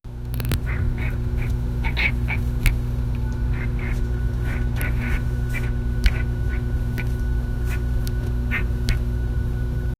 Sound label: the contented meowing of